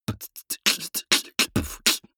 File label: beatbox sequence open